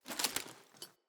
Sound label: Battle Dog Foley Jump; Snc Battle Dog